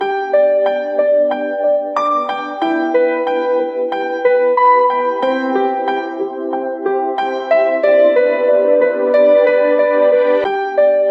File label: calm piano tone ringtone